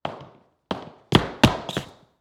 Foley Footstep Cowboy Boots Slip On Hardwood Floor; Foley Footsteps